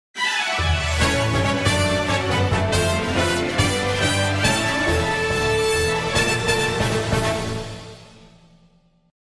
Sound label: Victory Fanfare; Earth Defense Force 5